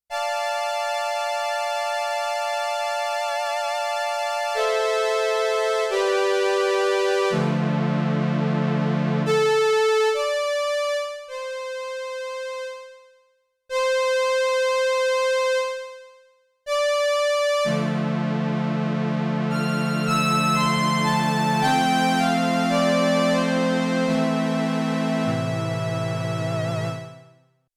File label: Single String Prophet, Prophet 5; Yamaha TX16W